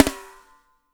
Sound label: SNR; Spectrasonics; Burning Grooves